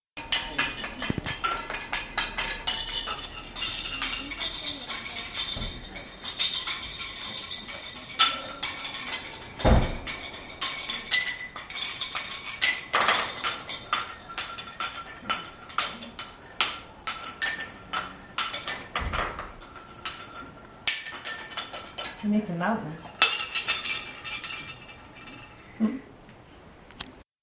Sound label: perc